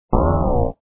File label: bounce